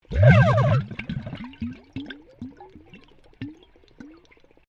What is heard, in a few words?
Underwater Airhorn Pool Burst, compressed air airhorn recorded underwater; Nature